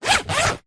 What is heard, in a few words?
tent bag zipper fast; foley; zapsplat